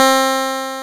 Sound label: SQRE WAV HI, INST WAVES